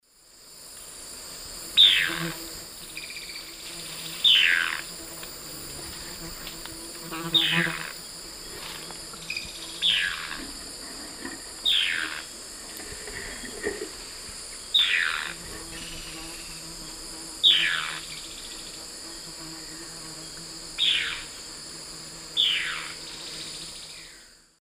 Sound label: Phlegopsis erythroptera, call; S. America; Eastern Lowlands